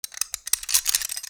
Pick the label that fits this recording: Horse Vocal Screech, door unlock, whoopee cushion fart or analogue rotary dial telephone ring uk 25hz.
door unlock